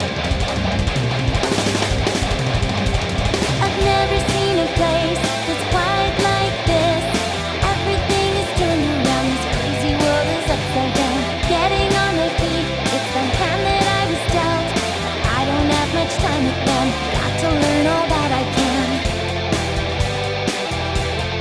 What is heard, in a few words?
Metal Remix